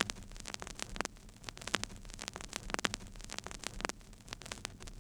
Vinyl, noise; layering

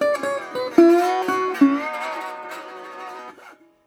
Blues Guitar; ILIO; Fretworks - Blues Guitar Samples; 120 SIMMS LICK

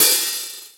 Rock N Roll, Drum Machines

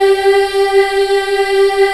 G3 child EH L, Choir; USB Soundscan vol.28

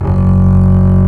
BASS F#0, Solo String; E-MU Producer Series Vol. 2; More Studio Essentials; Composer; Solo String Combo

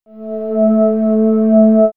Swirls Ambient Drone, pads; X-Static Goldmine

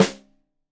Rim Shot Stereo, drums

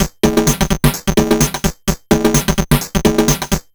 Bent TR505 (128BPM), Circuit Bent

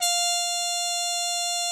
MUTED F4, TRUMPETS; Greytsounds VOL-1

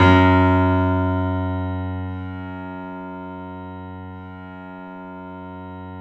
3D Boesendorfer, Pianos, F#; E-MU Producer Series Vol. 5; 3-D Audio Collection

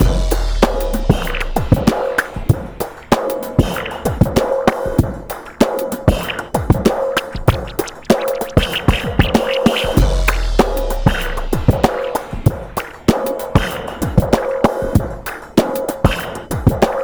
96.3 bpm, Breaks; 90 s Sample CDs; Planet of the Breaks

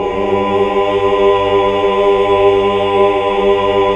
HAL AAH. AAAH Oct; Sound & Vision - Gigapack II CD 1 (Roland)

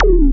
DRONEZAP; Back In Time Records; Big Bang

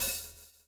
swing

